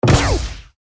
cannon shoot; Danger Mouse - Ultimate

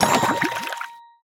Slime Cannister Pickup; Crash the Bash - Drink Break

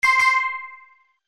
homing; Cartoon Network - Toon Cup 2020

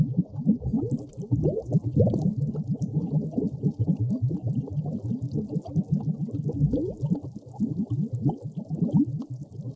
lava; Bunnicula - The Cursed Diamond

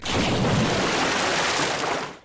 overflow splash; Ben 10 - Escape Route

